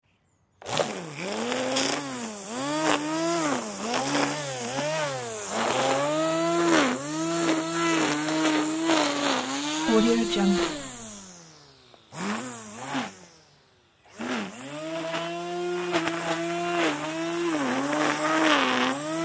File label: electric garden strimmer in action